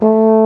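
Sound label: baritone A1 horn; InVision Interactive; Lightware VOL-1; Instruments & Percussions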